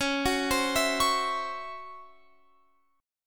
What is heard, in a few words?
C sharp Minor Major 7th Double Flat 5th, Guitar, Open F; C#mM7bb5; arpeggiated; x,20,18,19,19,20